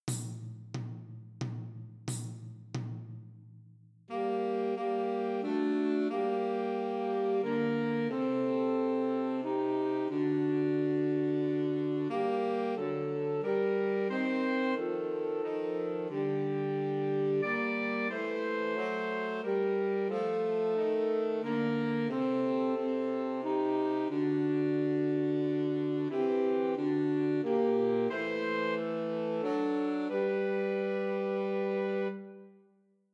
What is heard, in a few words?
Two Altos and Tenor Saxophone; Christmas Trios